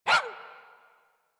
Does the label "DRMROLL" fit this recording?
No